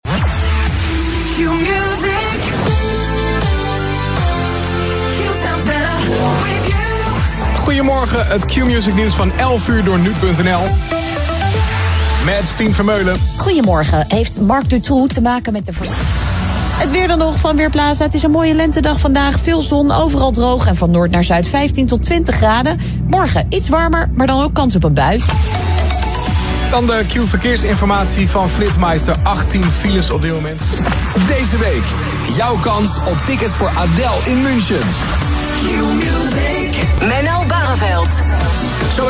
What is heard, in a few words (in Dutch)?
Qmusic jingles; april 2024; nieuwe audiovormgeving